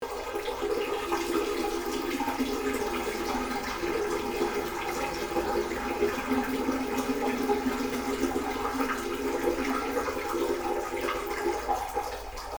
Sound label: Falling stream